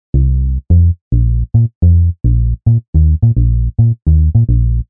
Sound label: BASS